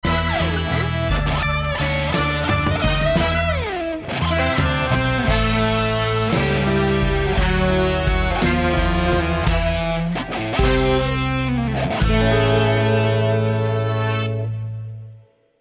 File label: Blues